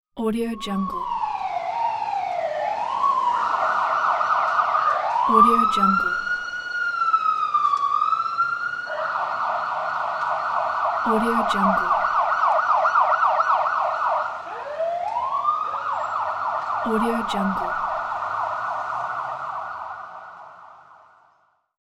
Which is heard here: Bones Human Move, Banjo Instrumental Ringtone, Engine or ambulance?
ambulance